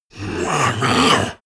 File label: monster gasp aggressive; kreaturen